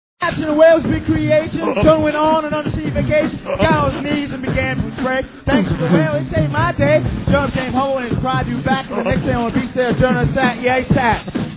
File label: rap